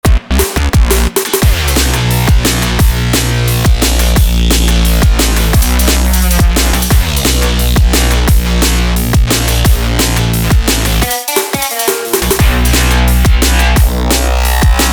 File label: dnb; vibranova; drum and bass essentials; golden screw studio